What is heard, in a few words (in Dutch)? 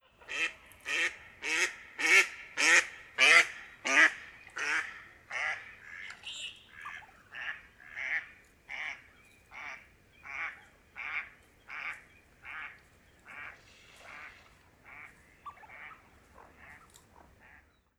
Wilde Eend Anas platyrhynchos